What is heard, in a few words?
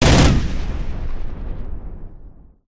security bot weapon fire; gow; fortifications